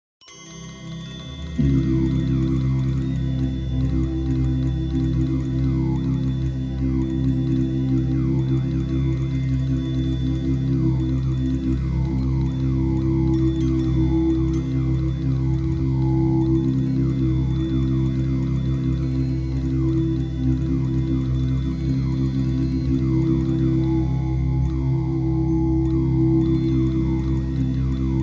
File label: Drone